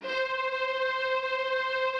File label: Instrument